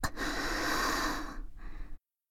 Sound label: Breathing